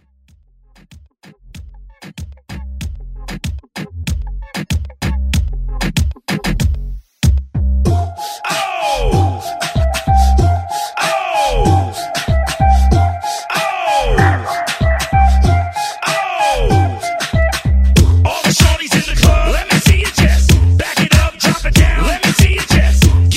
Reggaeton Remix

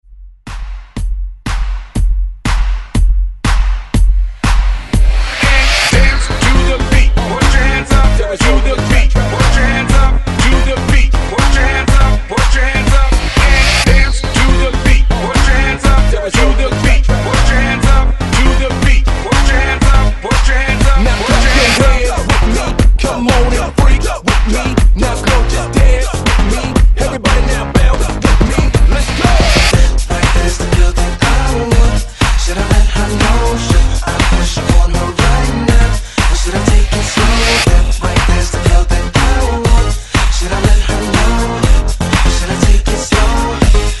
Hype Re Drum 121 Bpm

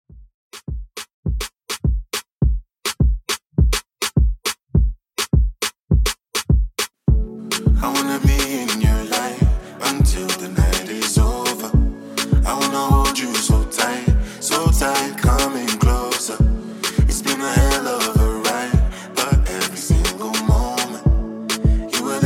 Afrobeat 103 Bpm

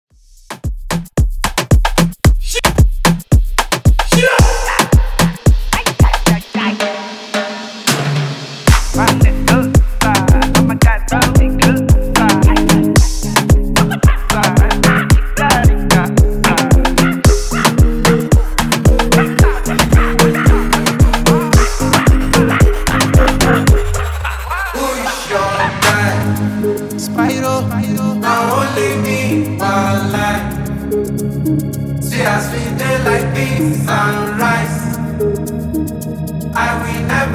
afro Reggaeton 112 Bpm

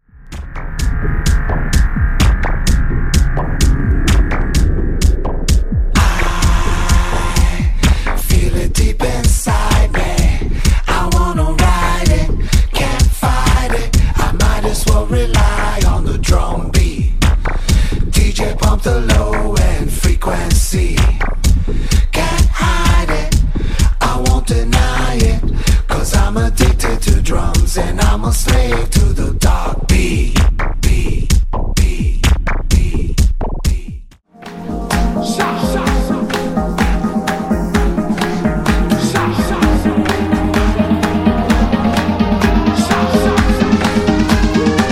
Dark Beat 127Bpm

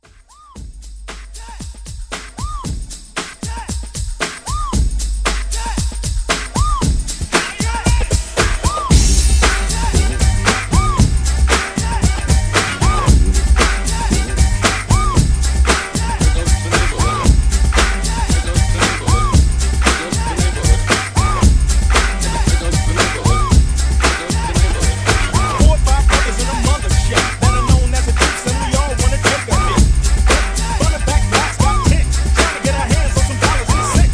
90s Old School Uptempo Re Drum 115

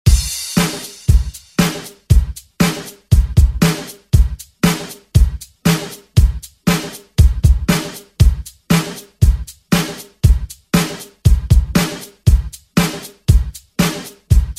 Christmas Redrum; Deejay Hit

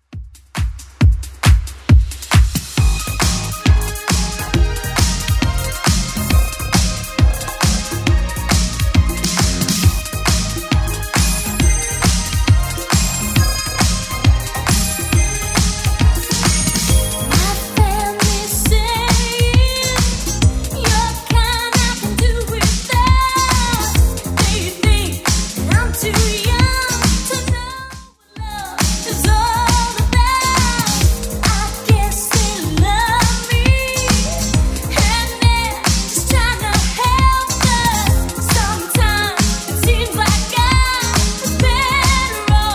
80s Club Edit 136bpm

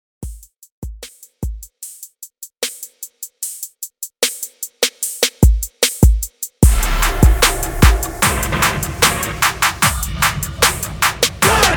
TRAP REMIX 75 BPM

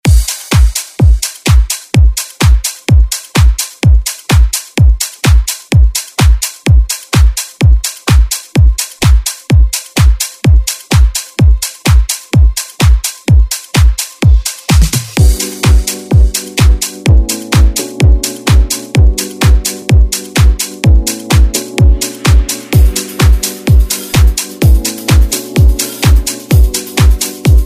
House Ext Redrum Clean

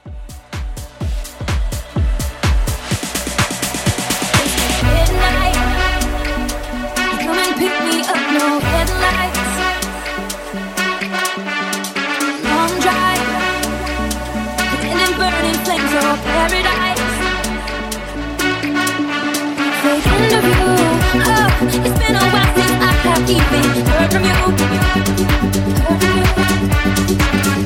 Mashup Bounce 126

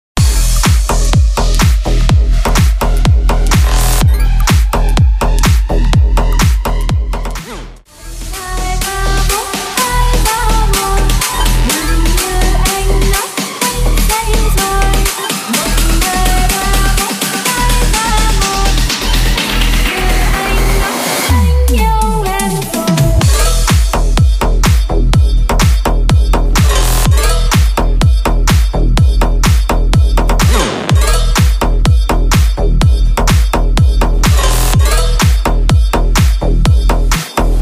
extended 125 bpm

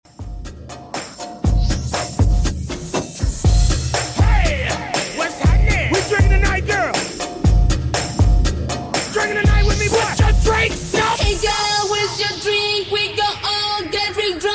2ks Redrum 120bpm; remixplanet

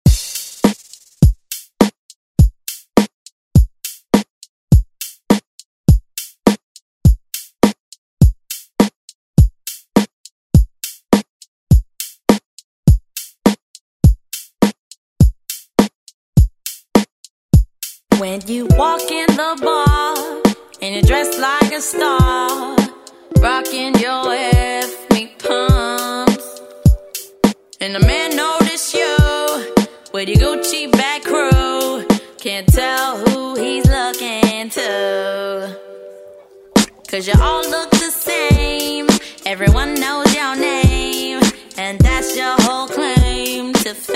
ReDrum; DJ Mhark

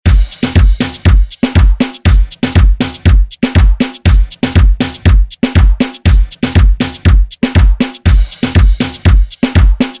Moombah Redrum

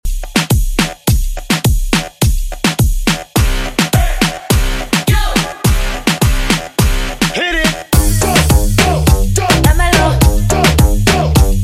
Moombahton 105bpm

